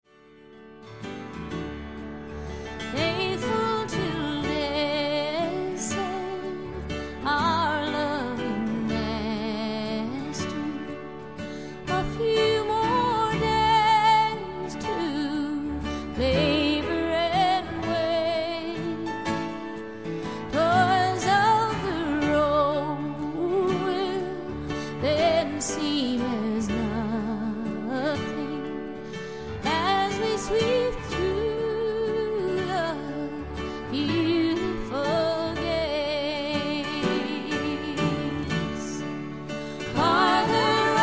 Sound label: Trio